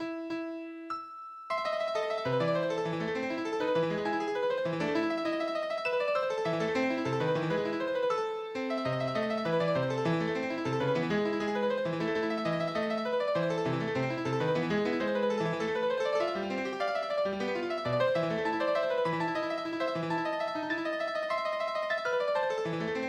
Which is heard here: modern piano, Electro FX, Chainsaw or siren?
modern piano